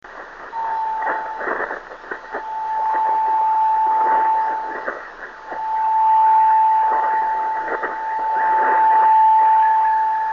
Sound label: 26530KHz, driftnet beacons